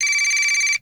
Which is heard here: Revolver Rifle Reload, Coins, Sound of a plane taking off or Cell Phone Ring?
Cell Phone Ring